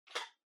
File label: Portable MD Far Charging Stand Remove